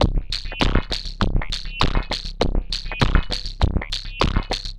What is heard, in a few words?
perc 100 clomp, Percussive Loops, 100 bpm